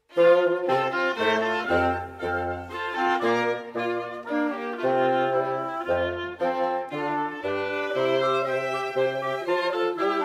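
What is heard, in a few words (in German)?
Holzbläserquartett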